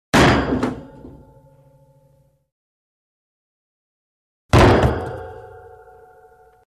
Body Falls On Grate Heavy Body Fall With Sharp Metal; Hollywood Edge Foley Sound Library